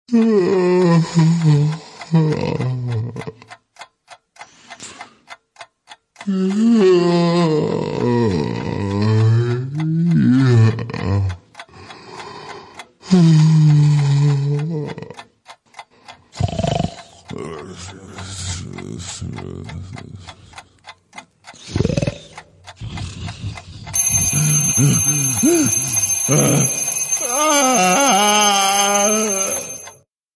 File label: yawn